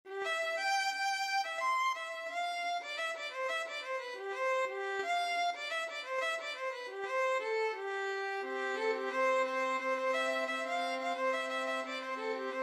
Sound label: violin ensemble